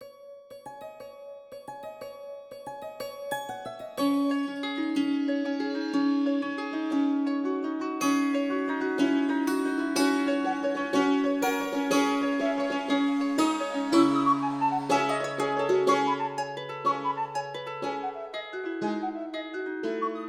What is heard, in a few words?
Tiny Forest Minstrels